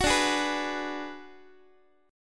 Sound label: D 6th Flat 5th D down Guitar, chord; D6b5 strummed; x,x,9,12,12,10; tuning 4A,4E,5A,5D,5G#,6C#